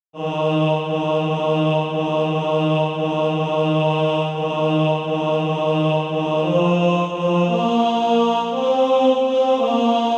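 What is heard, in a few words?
bass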